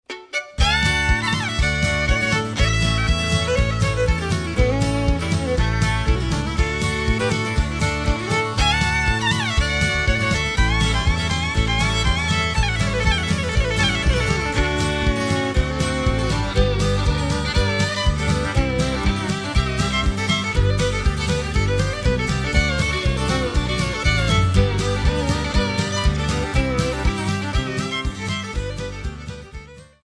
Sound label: fiddle; Joe's McAdoo